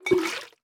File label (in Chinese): 玻璃瓶装水声